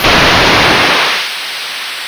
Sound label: Big Wave